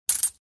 coins; toystory; hamm

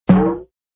jump; Cars; tractor tip

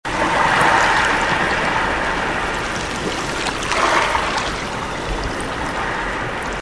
water loop; camp rock; rock n row

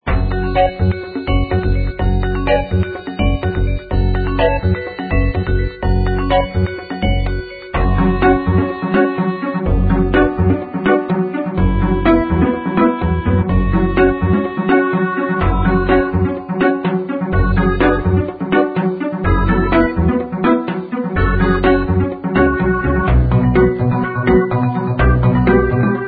theme; tnt cog

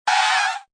game stop; toystory; toystorymania

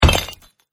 block break; holidays; snowbrawl

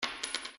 coin bounce; toystory; sarge

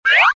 slide up

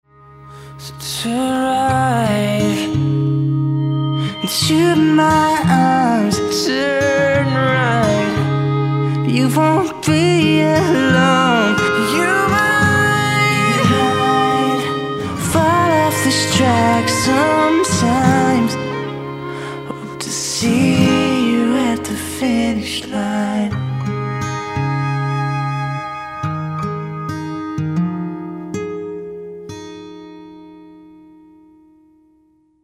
turn right

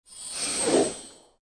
mirror zoom; snow white

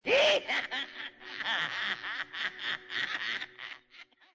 dark queen cackle; snow white

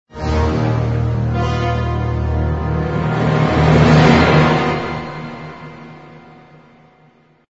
lose music; avengers; city Under Attack

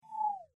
section Exit Alt; starwars; episode vii the force awakens

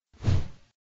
move left; toystory; hamm

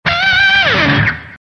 guitar

